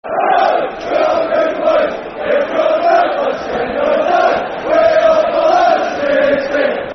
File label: Hello, Hello, We Are The Boothen Boys Football Chants (Soccer Songs); Stoke City Scfc